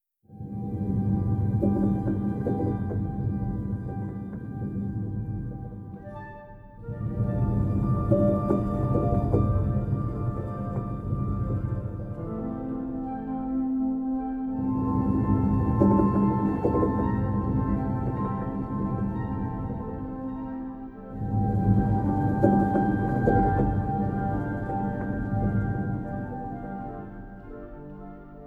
Drumming In The Rain Instrumental

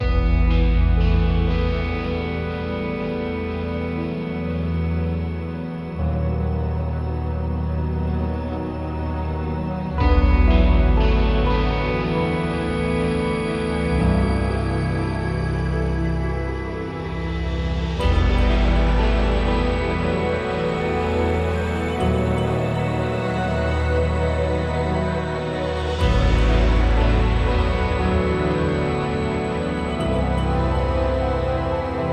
Heaviness Ambient

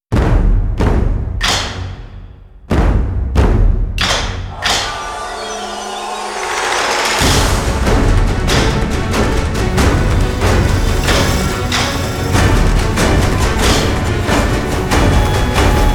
Warrior's Code, Pounding Percussion Taikos; Pounding Percussion vol. 3

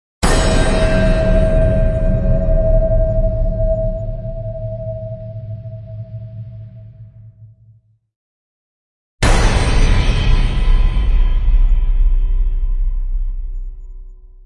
Impacts (Metal); Epic Tools vol. 1

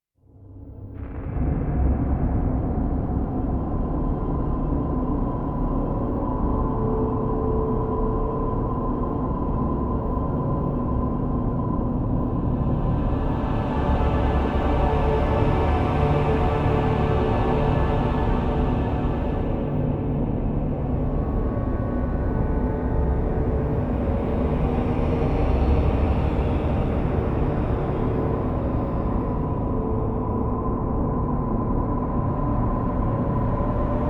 Spaceships Passing, Drone; Gothic Storm Music

